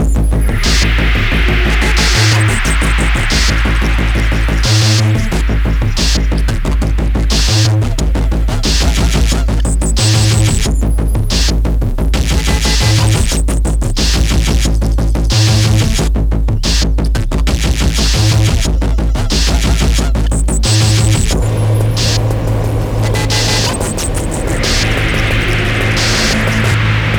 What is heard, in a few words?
Shield Music Loading; Battlefield 3